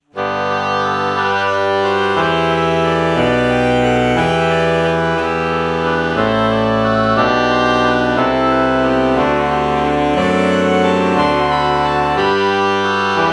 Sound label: alto saxophone oboe morph